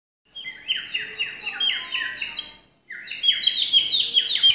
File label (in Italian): uccellino acqua